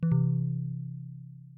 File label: balloon Drop; balloons-and-static-electricity